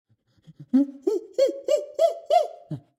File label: Monkey Win; Pitch Black Audio Pong